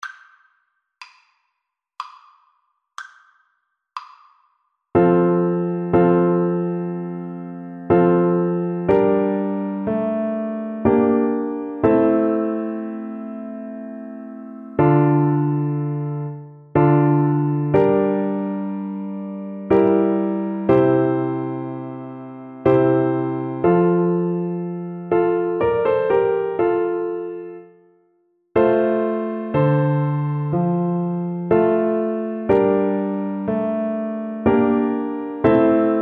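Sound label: recorder karaoke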